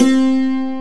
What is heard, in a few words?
SANTUR; Groovy Bytes: Behind the Moon